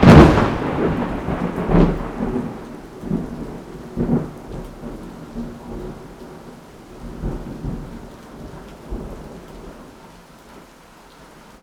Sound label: Thunder Lightning Strike; nvidia gameworks; waveworks